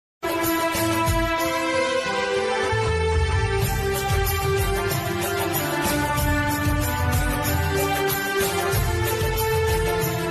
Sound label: independence day background music; 15th august